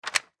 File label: Note Remove From Wall Tape Paper; Office; Blastwave